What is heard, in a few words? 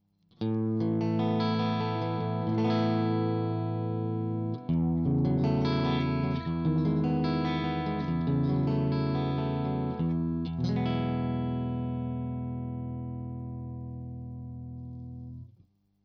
clean Position 3 KSM313 back